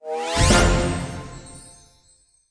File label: medium Win; jacks or better multiple hand